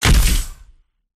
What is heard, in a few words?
silverback impact; gow